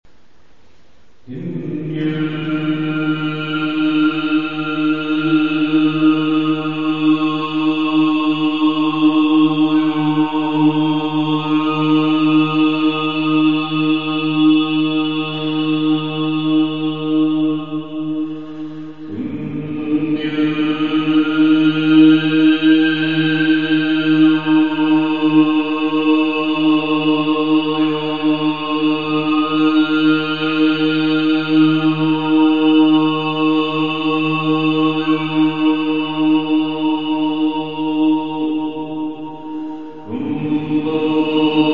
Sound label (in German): Oberton